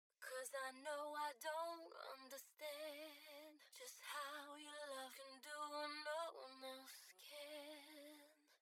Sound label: Stereo Widening Classic Widener Solo, Mixing Lead Vocals